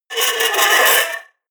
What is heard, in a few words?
scratch